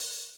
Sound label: dark tone open hi hat one shot c sharp key